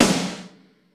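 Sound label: GATE SNARE; Crypton - DrumBase5000; GATE KITS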